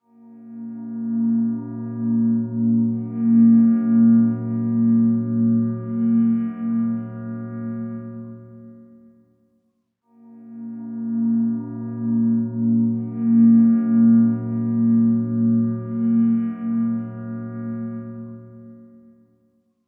Bell, Salzburg

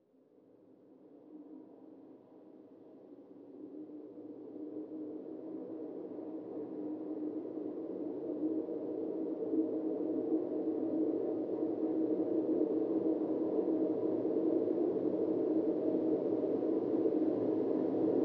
Wind Whistle

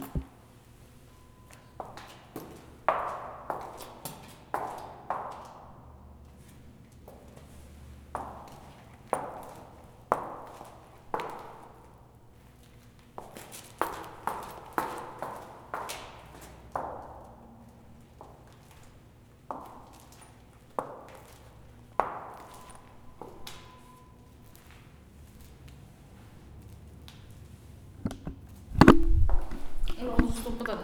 foot Step

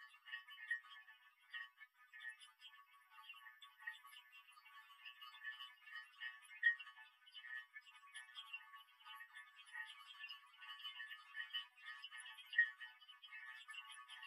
Creek, Garden

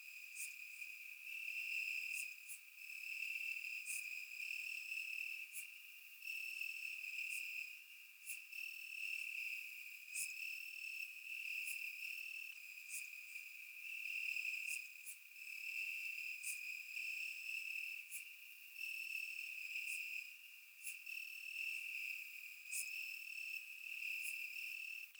Cricket, Garden

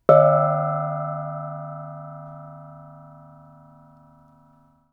Inharmonic Gamelan

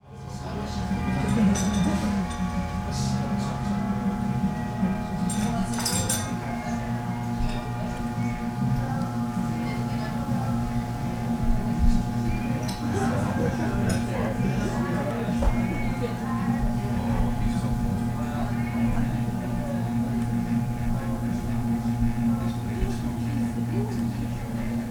Crossfade Hums

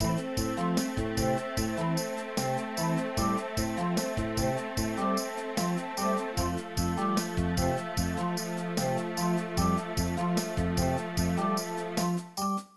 stem organ

